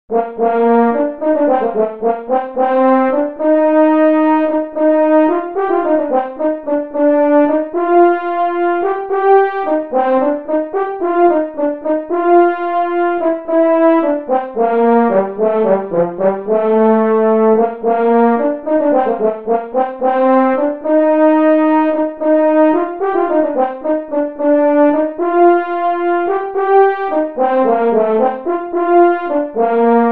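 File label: horn fast; middle school; Region 23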